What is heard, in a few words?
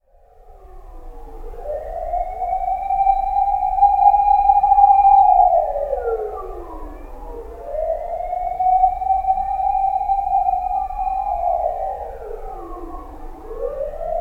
fire engines